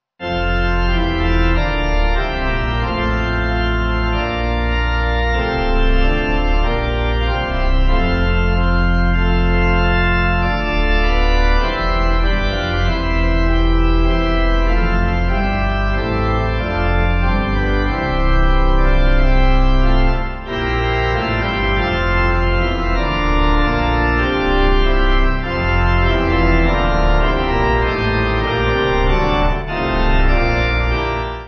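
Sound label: pipe organ hymn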